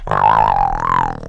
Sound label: die